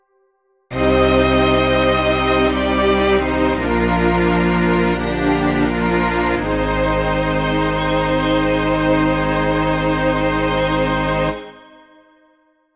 RPG gameover

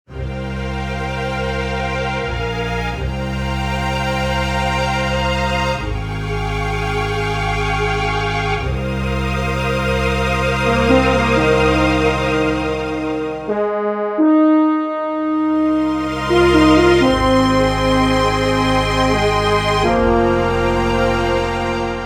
RPG prologue; Event

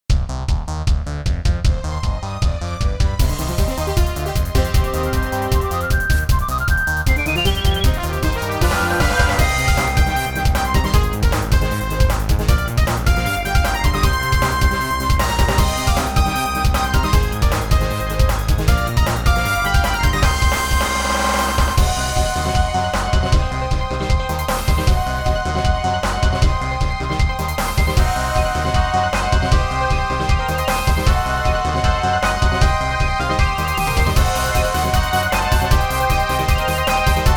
RPG boss; Battle

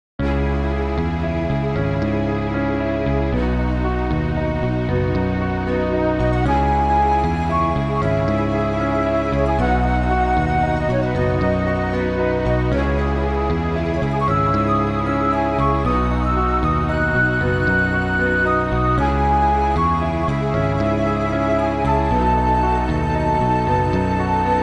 RPG forest; Dungeon

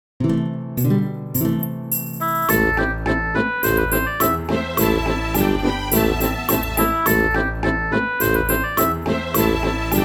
RPG village; City

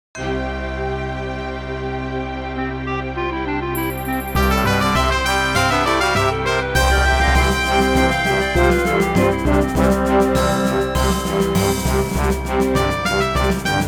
RPG castle; Edifice

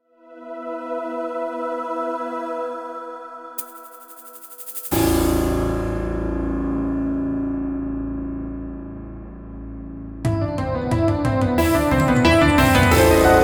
RPG ghost town; City

